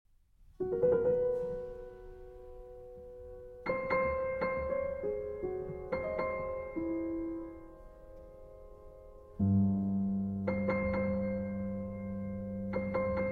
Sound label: Twittering, Grand Piano